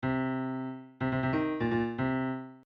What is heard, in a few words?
Tuba Line